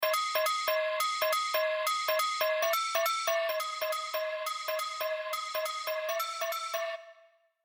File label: Spect Bell